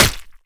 flesh, bullet impacts; physics; Half Life Model Pack

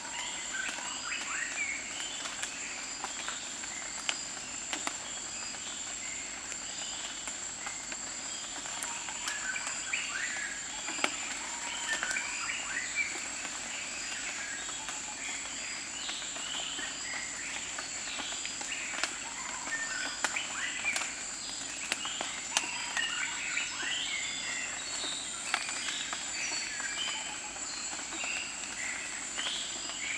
jungle exterior; Counter Strike Model Pack; CS:GO; aztec2

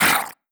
bold angry; creatures; headcrab classic; hideout

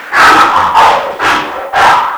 hunter charge; npc; ministrider; Half Life 2; Episode 2

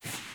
step slide, zombie; creatures

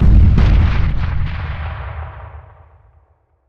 explode distant, grenade; hegrenade; weapons; Counter Strike Model Pack; CS:GO

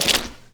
fs fm paint, footsteps; Portal 2

